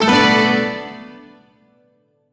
Shawzin Maj Chord L; Music; Warframe; Duviri; Chords

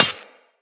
Laser Impact Metal

